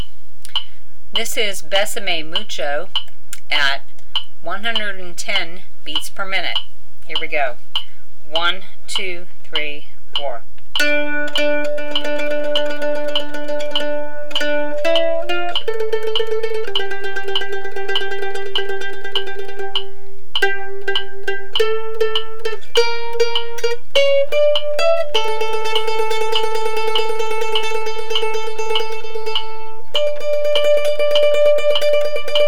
110bpm, mandolin

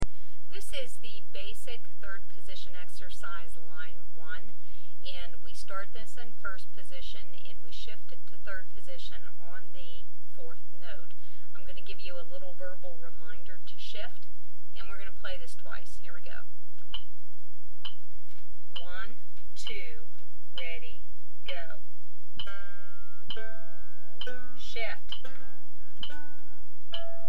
Basic 3rd Position Line, mandolin

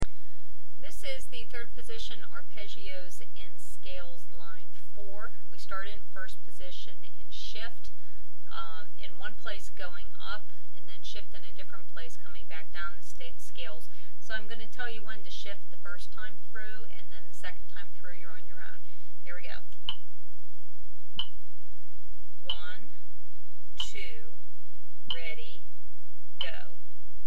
Third Position Scales And Arpeggios, mandolin

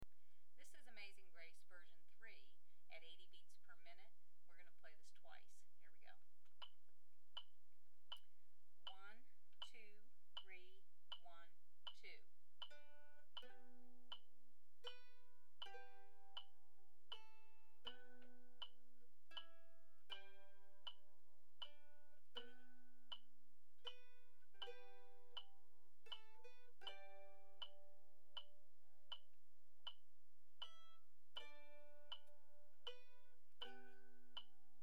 80bpm, mandolin

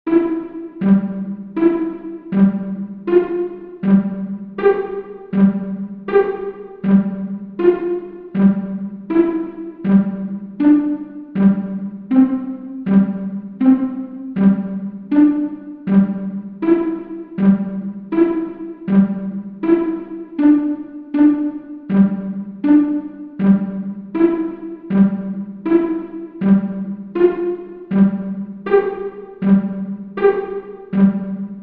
Ode To Joy Exercise 80bpm, mandolin

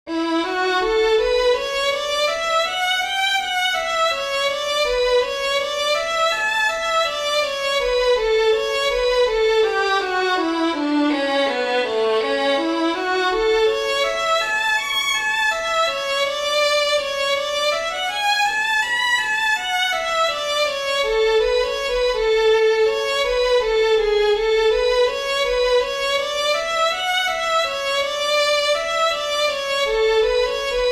Solo Break 80bpm, mandolin

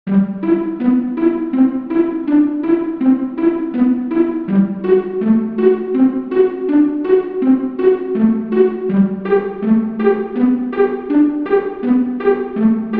Polyphonic Mandolin Exercise 80bpm

